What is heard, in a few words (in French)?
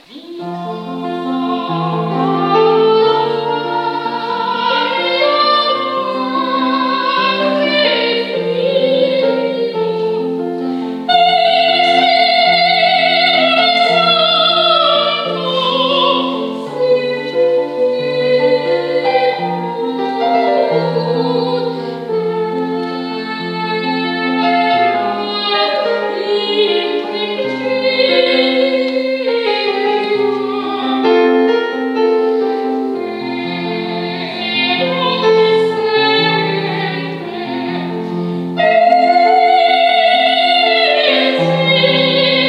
laudate piano 1ère voix